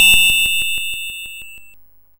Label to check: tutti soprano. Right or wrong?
Wrong